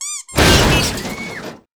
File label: Crash